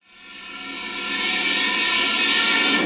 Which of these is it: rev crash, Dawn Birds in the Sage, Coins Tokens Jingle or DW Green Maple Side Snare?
rev crash